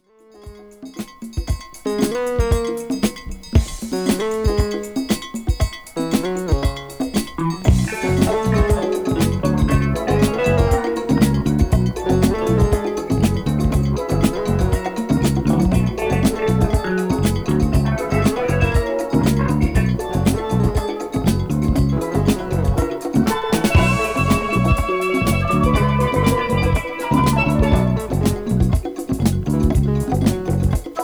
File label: salsa